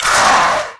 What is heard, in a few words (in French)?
Zap magique